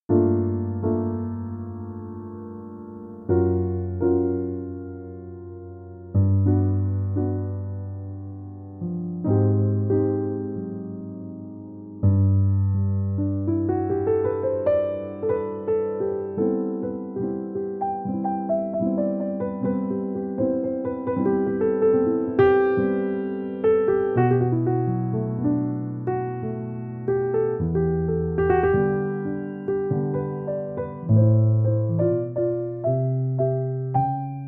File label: Jazz Instrumental Chill - Superb Jazz Piano